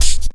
PERC, Drum & Bass; Ueberschall; Drum & Bass Resonance

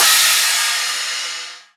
Crash, Cymbals

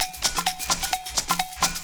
Dance Grooves; Best Service; Dance Mega Add On Grooves

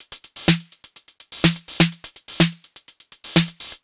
drum loop noclap top 125, Classic Chicago House; Sample Magic; Drum Loops

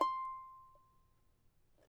charango C5; Spectrasonics; Hans Zimmer Guitars VOL-2

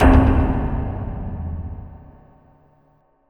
big clank

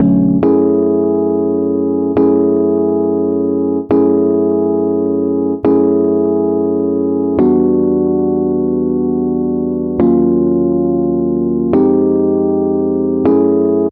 Rhodes, Cinematic Funk Urban; Sonic Foundry (Sony Creative Software); Cinematic Funk Urban Tone Poems

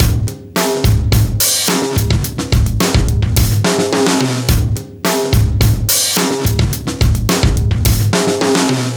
107 live bouncey drum

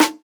Snare, jungle breaks drum sounds

